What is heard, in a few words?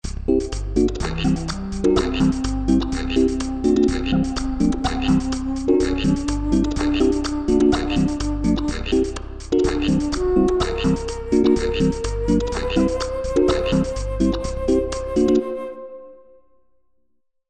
Sing